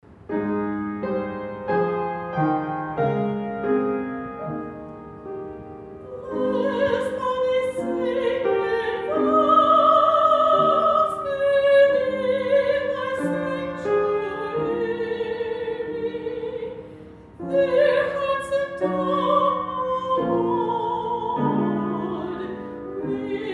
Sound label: Blessed be This Day, soprano